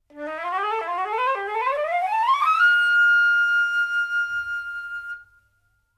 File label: flute rise